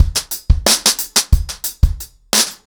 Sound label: 90BPM, acoustic drums, drumloops